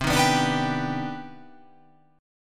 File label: C Minor Major 9th, chord